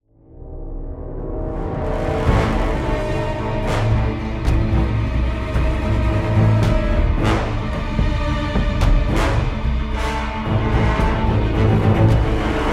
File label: Loop Fight